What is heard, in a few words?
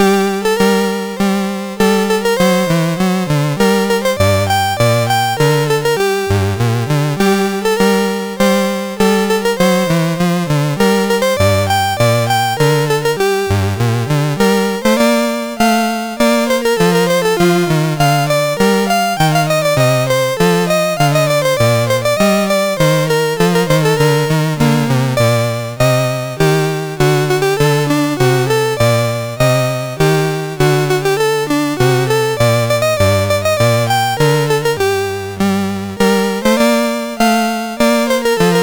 poloniase in G minor, MOS6581R3